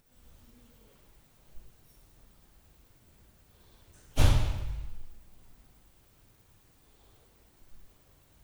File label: DOOR NOISE; Night Noise